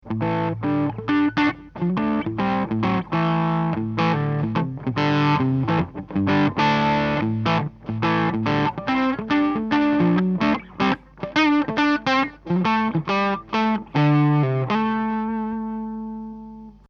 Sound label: crunch tube